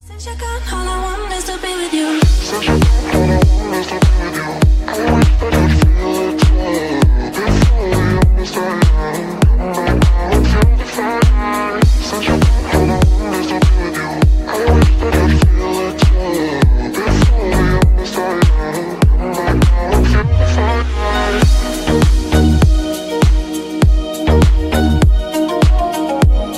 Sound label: orientale ringtone